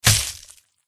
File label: flower attack contact bottom